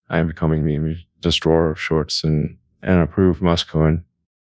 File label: vocal